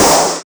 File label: Clap; Vengeance Samples; VEC1 Claps